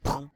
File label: collide